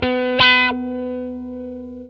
WAH NOTE, Distortion Gtr; PlatinumPhattCD2; E-MU Producer Series Vol. 8 – Platinum Phatt

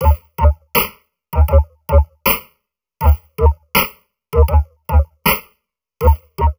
Drum Loops Crazy Processed

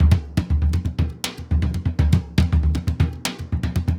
surdo, merengue; Best Service ProSamples vol.14; AKAI

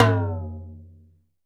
sfx perc

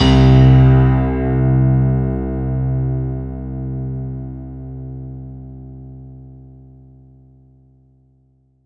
C1 DANCE, ANALOG SEQ, Roland JV 2080

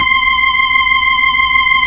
B-3, Full Xfade

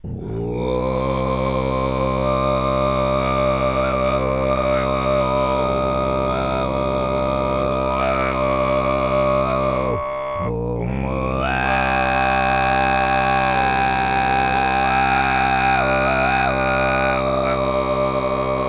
FREE, TUVAN LOW; Spectrasonics Vocal Planet CD5; World Voices

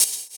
Open Hhat, HiHat; Equipped Music; Loungin House

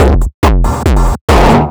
Beat, Drums & Percussion; Electrocution; Beats

